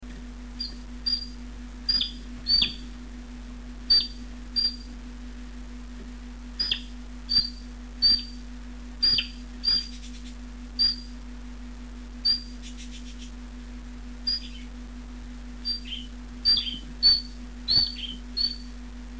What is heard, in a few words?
otter sound long